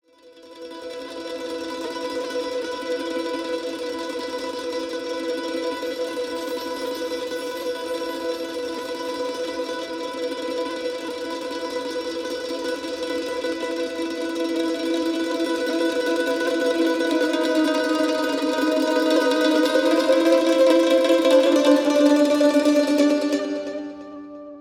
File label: Acoustic Instrumental; Pyre; The White Lute